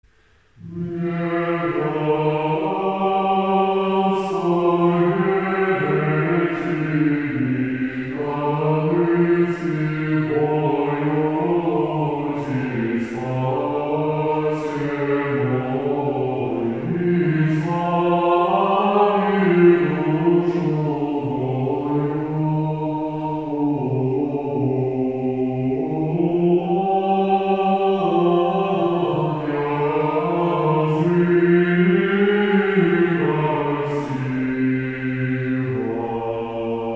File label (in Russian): Антифон 5 гласа; 1. Внегда скорбети ми